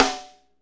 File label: SNR FNK, Funk Snares, Roland; Northstar - Drumscapes Roland